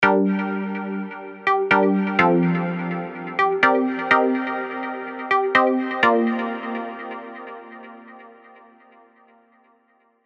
Dub Vibes

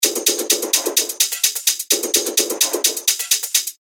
128 BPM

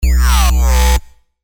Wobble

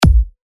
Punchy Kick E

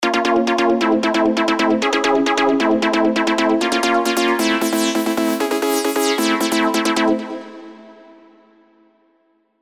Stab Laser Dub

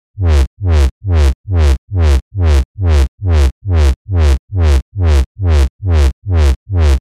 Reverse Bass Loop E (138 BPM)

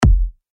Kick D#; TECH HOUSE

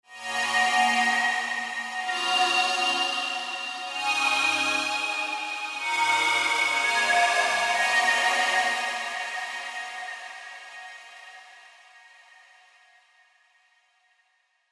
Jupiter Synth, serum pads

